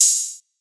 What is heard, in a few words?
OH, Open Hats